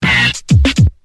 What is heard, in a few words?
delay, Kick Snare; Drum loops